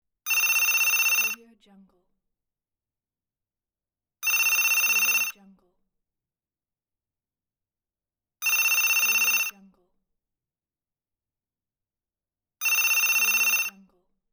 office phone rings

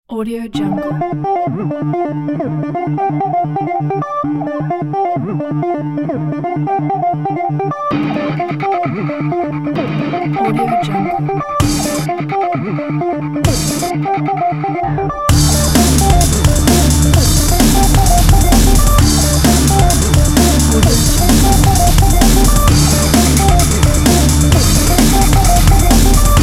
road energy

